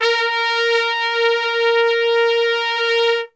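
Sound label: A#2 piccolo trumpet, Brass; Best Service; Brass Super Section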